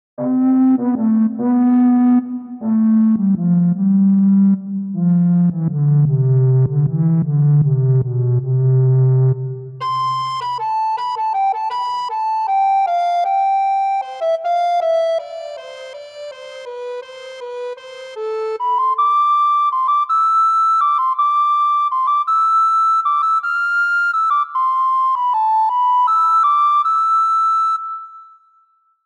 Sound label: FLAUTO DOLCE